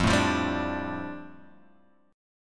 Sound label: F 6th Add 9th F Guitar Half Step Down